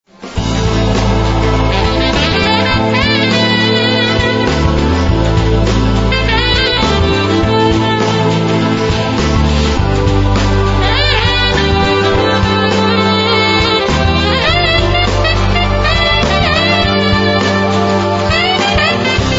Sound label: rubber, sax